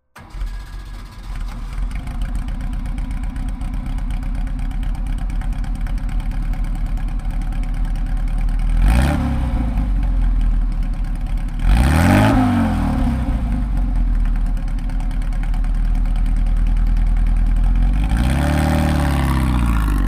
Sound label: porsche 550 spyder